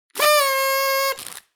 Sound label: party